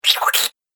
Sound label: Nasty Little Monster; Monster Sound Effects